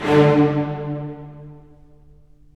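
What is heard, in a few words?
STR, strings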